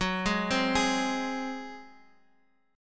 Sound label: G sharp 7th F sharp